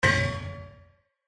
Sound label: Spin Stop Win; District Samurai